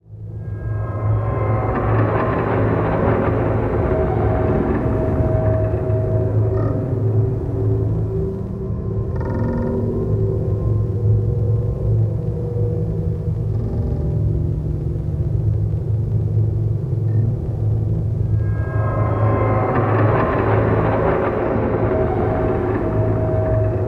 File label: drone gates; Whatisvalis; unEarthy Ambience